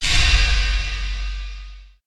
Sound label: Warn Stop; SCP-1576